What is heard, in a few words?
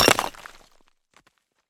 pickaxe hit